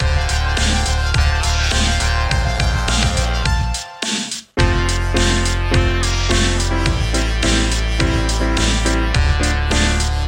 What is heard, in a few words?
Pop Rock